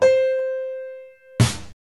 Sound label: piano snare 10ms